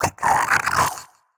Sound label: Victory Vo; Shadow Minion3